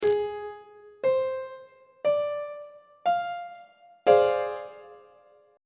d half diminished 7th 2nd inversion on treble clef